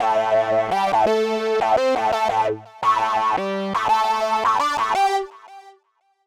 Synth line 85 A, hiphop 85bpm; musicradar

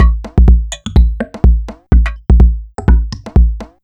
Beat 125BPM (Kick Perc), techno; techno drum fx

